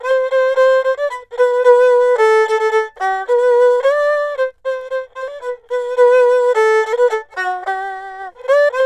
ERHU 110 G; INIS - Opium

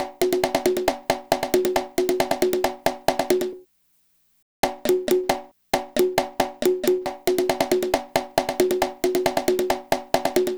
Vegas Bongos Dry, 136; Zero-G Kocktail Kollection; Construction Kitz (Lounge); Vegas Time 136

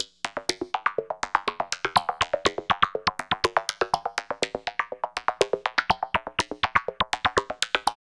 LOOP, Tekno Industrial; East West

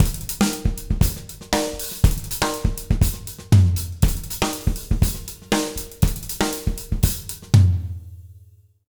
120 JROCK, Drumloops

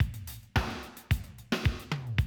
drum loop, 100 BPM

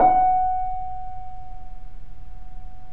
The Piano, F#; InVision Interactive - The Piano; BOESINGSOFT

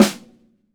SONOR, SONOR SNARE

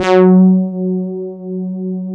DS8 F#3, Korg; Back In Time Records; Korg Universe VOL-1

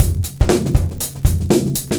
LOOP, 70's Breakbeats; USB Soundscan vol.46; AKAI

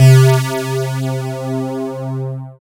SYN BELL, SYN Analog; Sound & Vision - Gigapack I CD 2 (Roland)

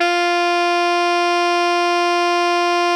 alto ff F 3, Sax

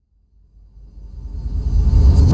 rise low swoop, transition; Polyend; Recue

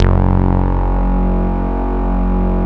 CS-15, BASSES; Masterbits - Vintage Voltage; VV BASSES

